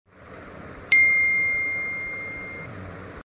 apple phone notifications iphone notification sound iphone message